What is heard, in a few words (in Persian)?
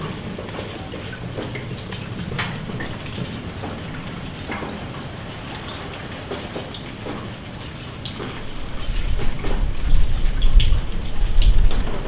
Sedaye Baran